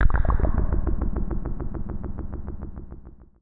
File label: SPACE FX 140; SpaceSounds